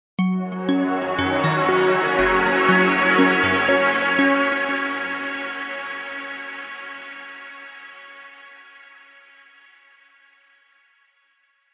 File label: multimedia musical logo ident warm positive arpeggiated